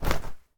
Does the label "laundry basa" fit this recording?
Yes